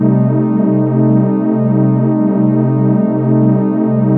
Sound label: tension, ambient atmospheres